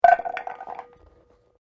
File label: Carrot into metal bowl; Food preparation; Restaurants